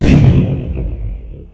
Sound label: big boom